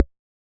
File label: land; runner